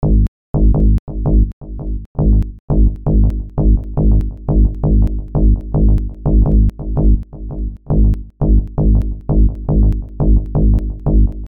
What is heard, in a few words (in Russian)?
Сэмпл 84 бас